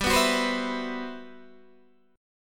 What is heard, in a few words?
G Major 13th, Guitar DADGAD